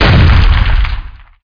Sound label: Supergun; SpecOps2 MPlayer Demo